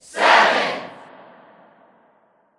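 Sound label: Ambience Tennis Crowd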